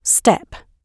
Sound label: step